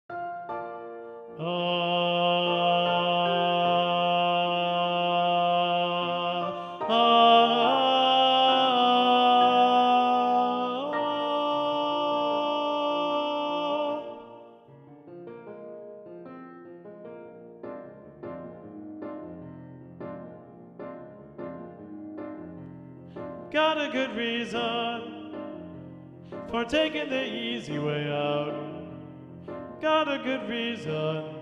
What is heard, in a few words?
satb tenor predominant